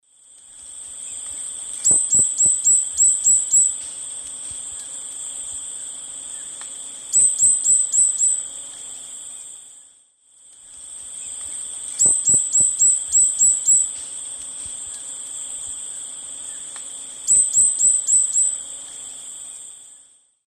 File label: Hylocharis sapphirina, calls; S. America; Eastern Lowlands